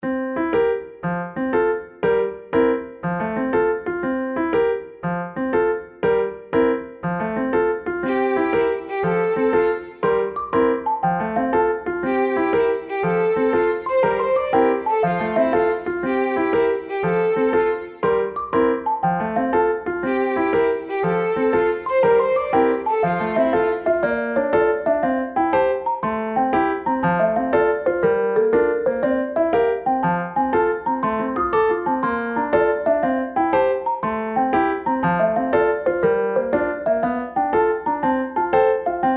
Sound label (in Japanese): ピアノ, 2拍子